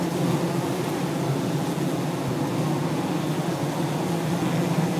Scarab fly; Legend of Keepers

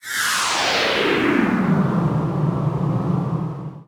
Wounded

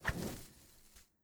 Godess Sceptre

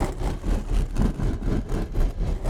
deity single spin; unsighted; enemies

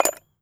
Metal Small Movement; Metal Small Movement 13

